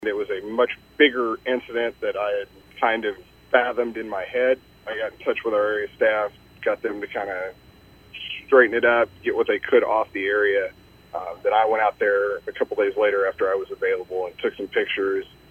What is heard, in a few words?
Horn; Wordpress